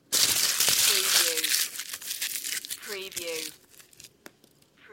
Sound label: Metal foil, aluminium foil crunch